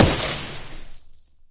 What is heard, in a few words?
hit water, weapons; source engine; dod